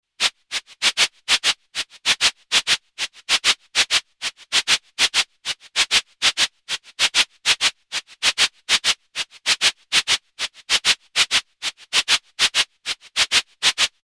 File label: Moog DFAM Patch Shakers